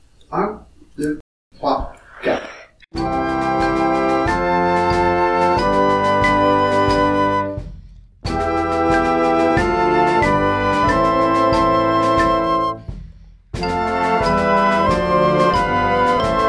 andante noire a 76

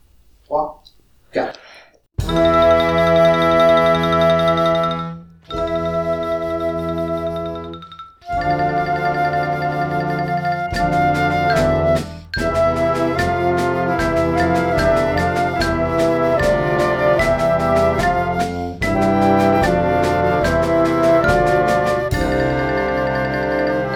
mirage noire a 72

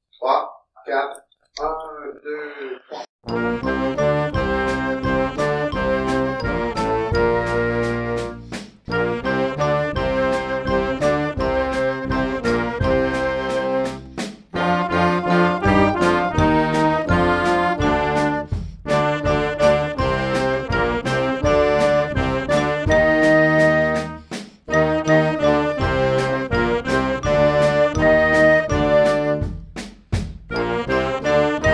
noire a 80

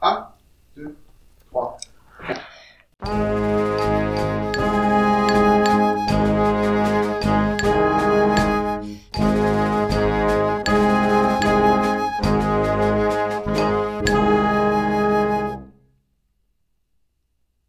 oh la la bemol noire a 80

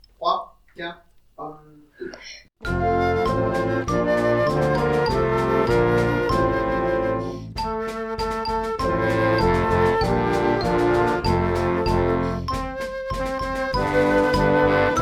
quand il neige noire a 96

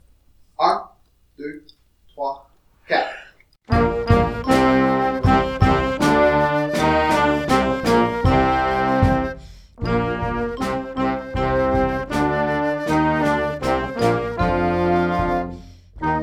ouf les soupirs noire a 80

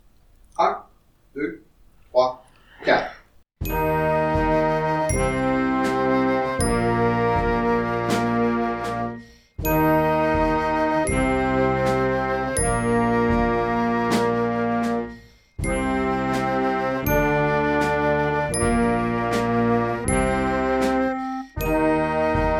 on descend noire a 80

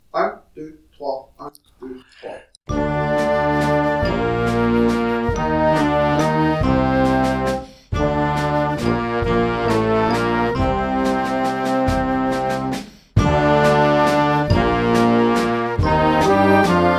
valse joyeuse noire a 144

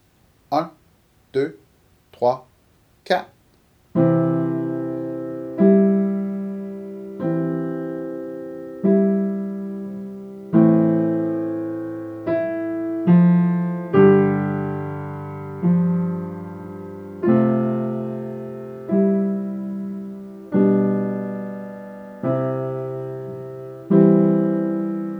solfege

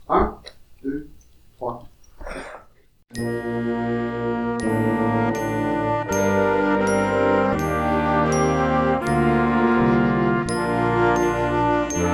a la claire fontaine classique noire a 80

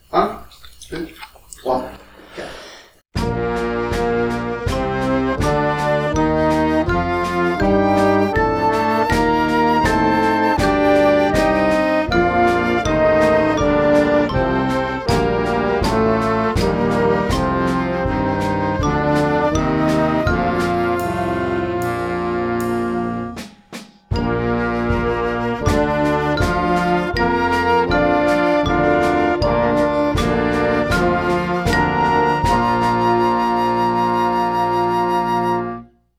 es tu gamme noire a 80

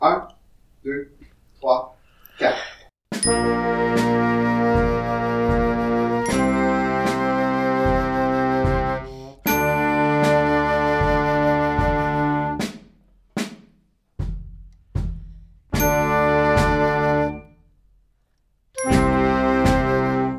soufflez noire a 76

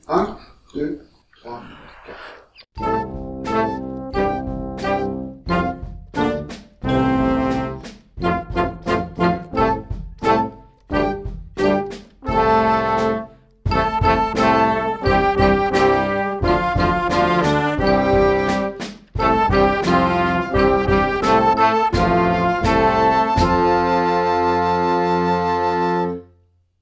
note la la note la noire a 76

